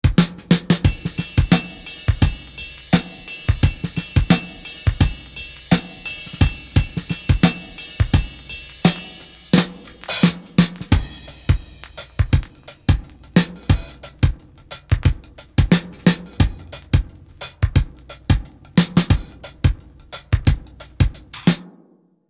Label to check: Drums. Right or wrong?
Right